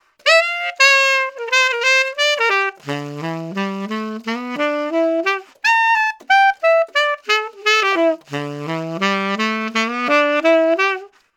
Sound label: Altissimo; SYOS